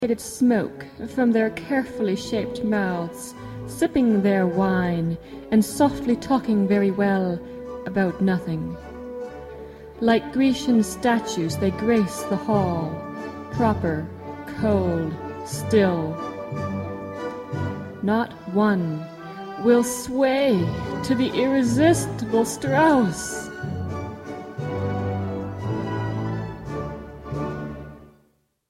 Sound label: Variations on a Scream, Tuba